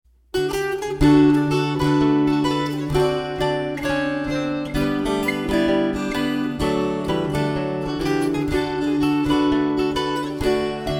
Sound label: Rag fast